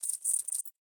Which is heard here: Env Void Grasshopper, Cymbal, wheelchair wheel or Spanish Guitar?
Env Void Grasshopper